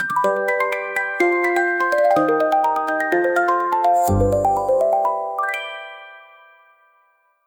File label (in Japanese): kirakira magic shower; free jingles